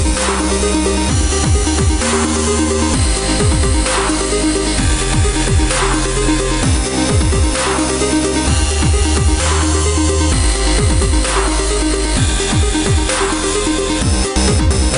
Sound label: DUBSTEP